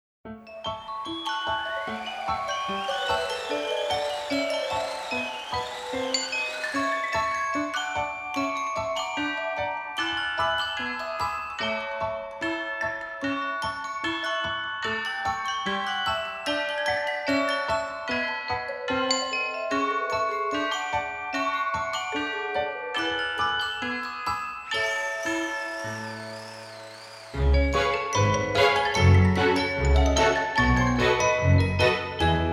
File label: Music Box Rag; VSL